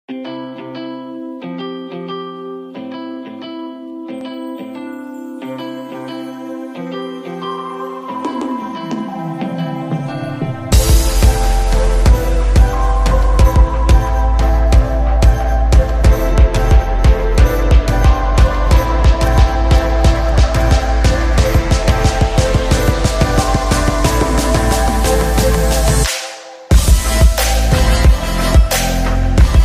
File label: instrumental ringtone